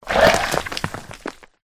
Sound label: worm attack voice bottom